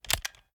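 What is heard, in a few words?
pistol reload empty fast extended mag end; gmod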